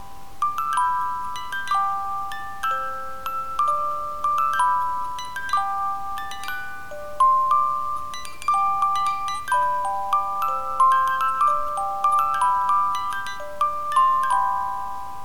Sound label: Amazing Grace, Music Box